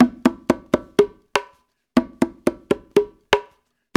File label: 120 TONGA, African Ethnic Drums; Spectrasonics; Supreme Beats